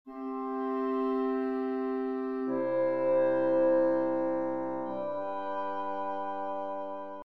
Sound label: chords; Nikolai Rimsky Korsakov